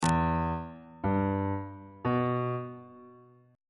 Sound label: f flat minor chord 1 is f flat minor on bass clef